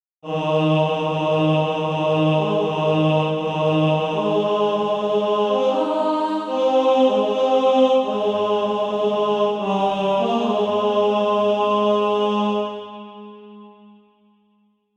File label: Bugle Call ten